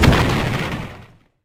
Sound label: Minecart Explode